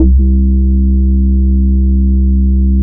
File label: ragga, bass analog & synth; SPECIALATE; USB Soundscan vol.12; AKAI